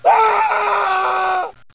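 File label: background music result; svencoop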